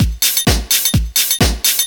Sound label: Straight Loop 128 BPM, House